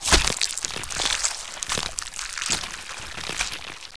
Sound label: eat